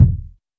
old school ambient kick drum one shot d sharp key

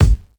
bumpin kick single shot g key

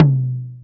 subtle reverb tom drum single hit c key

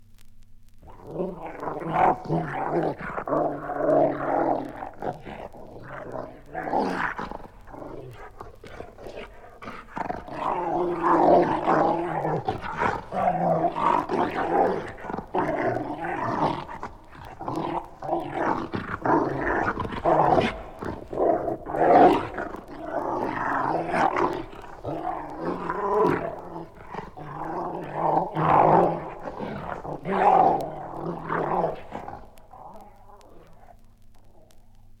hell-hound growling and snarling

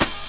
studio hat sound sample d sharp; hat samples

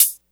airy pedal hi hat d sharp; hat samples

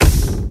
classic clicky bass drum sound f sharp key

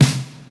warm sounding steel snare drum sample c key

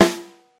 warm snare drum sample f key; snare-drum-samples

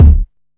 wet kick single hit d key; Channels Mono

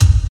puffy top end bass drum sample f key; kick drum samples

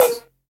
high tom a sharp key; tom drum

